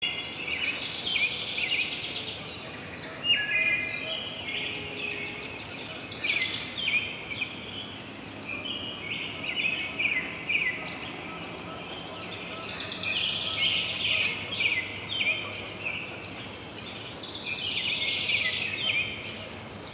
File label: Forest Sound Effects; Sound Ideas